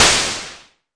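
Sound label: mbrute noise, Arturia MiniBrute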